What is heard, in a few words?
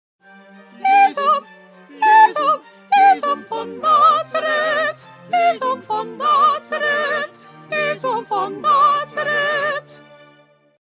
Soprano; Bach; St John Passion